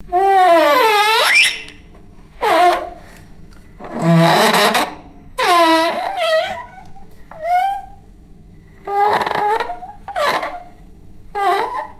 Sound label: wet squeaking on a porcelain bathtub